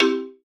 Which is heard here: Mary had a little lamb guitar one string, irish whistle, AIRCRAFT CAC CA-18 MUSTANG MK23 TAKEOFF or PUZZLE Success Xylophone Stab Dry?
PUZZLE Success Xylophone Stab Dry